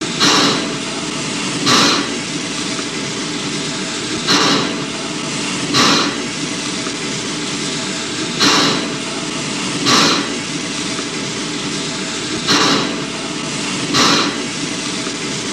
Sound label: Boiler Chuffs Slw, steam train